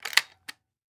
Weapon Gun Shotgun 12 Gauge Benelli Automatic Ammo Load; AdobeCC